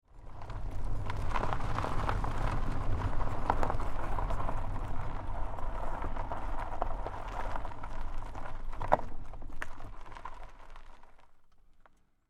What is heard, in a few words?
Car Tire Onboard Gravel Dirt Roll Slow Short; Cars